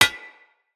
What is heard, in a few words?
Bullet Impact Armour; Weapons